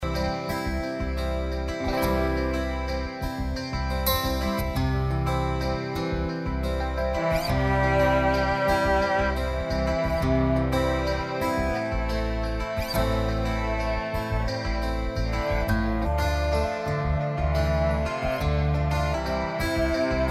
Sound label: korg pa3x gitar modu